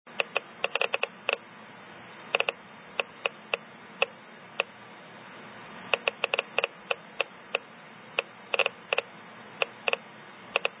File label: Uraninite autunite Ruggles Mine Grafton NH 1 inch 10 sec beta